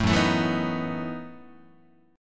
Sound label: F sharp Minor 13th, Guitar; strummed; JGuitar